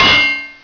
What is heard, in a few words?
umbrella impact